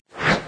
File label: sword swing; Smite; Cabrakan